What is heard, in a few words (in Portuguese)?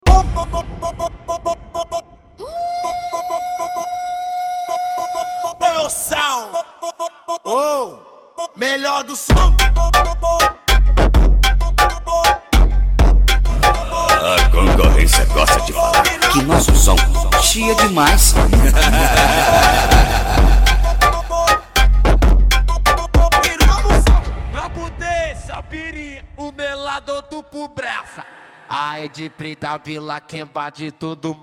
ARABE FUNK BASS, SOM AUTOMOTIVO